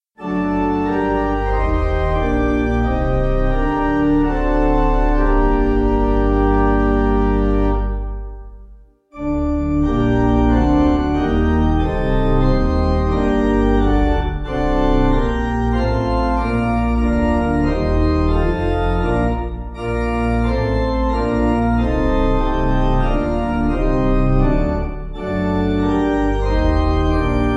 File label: Pipe